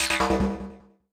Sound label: black magic down